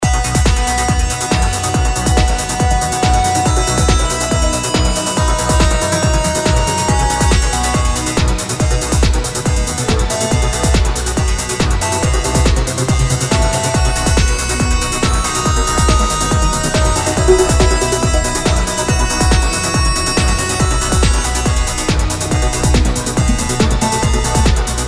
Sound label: VioLiN